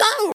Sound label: Scream